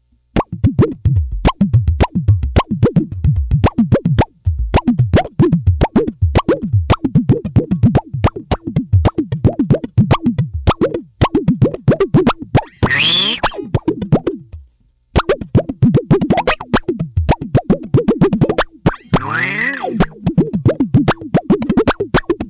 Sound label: Bubbles Tube Zipper